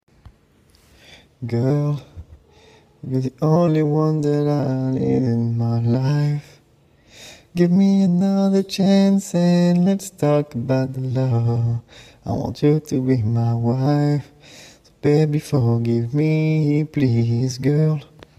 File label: rnb 90 Cm, Acapellas; Forgive Me